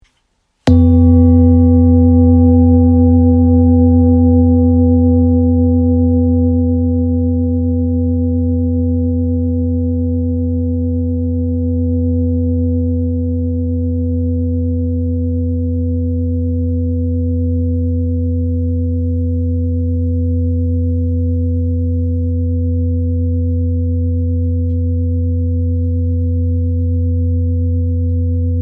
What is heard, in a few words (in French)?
Bol chantant therapeute fa